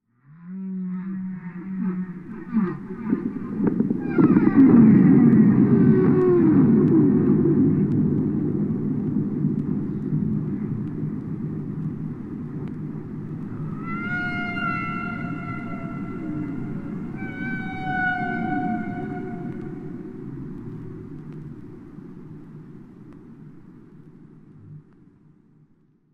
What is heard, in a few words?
whale song; Summer Catchers; Mirage